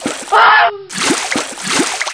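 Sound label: water death; quake2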